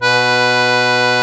AKK ORGAN H0, AKKORDEON, Alpine Volksmusik; Best Service

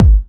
Kick, Kickdrum; Equipped Music; Loungin House

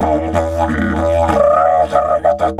Didge 93 BPM, Didgeridoo

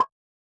Yamaha DJX 2B, HH56 T9 Filt Open

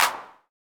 808 & 909 Kit CLAP; Roland Juno-D

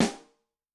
RIM Brass, Yamaha DTXpress-II snare

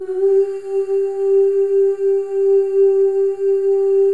OOSC, FEM OOS, Voices

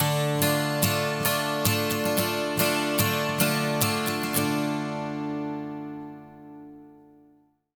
MIN 12 S, Acoustic Guitar Loops; USB Soundscan vol.04 - Electric & Acoustic Guitar Loops; AKAI

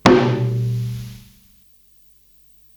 Rack Tom, Psychedelic Drums

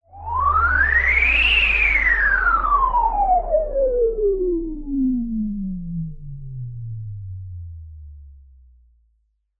Juno Noise

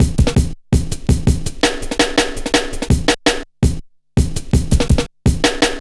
Cool, Drum Loops, 165.5, Jungle; Zero-G Jungle Warfare 1, 2 & 3

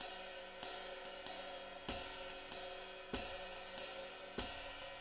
swing, drums; Sampleheads; Peter Erskine; Living Drums

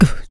HIT, Jazz FX FEM PERC

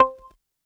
Clave Tone; Perc; Lost Tapes From Mars

